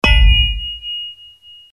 sword deflect stone; conan

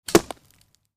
Arrow Impact; bow release, impact; The mossy stump proved the perfect surface for eliciting a fine impact thud and debris scatter.; North woods of Wisco

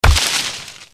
sword deflect thicket; conan

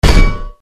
axe heavy armor; conan

